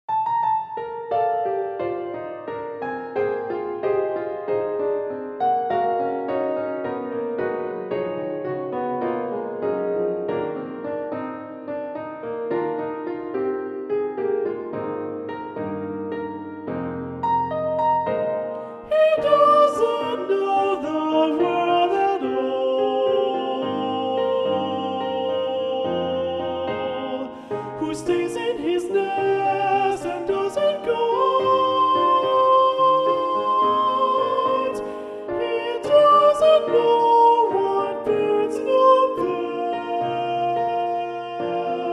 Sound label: 2 part treble balanced voices; birdsong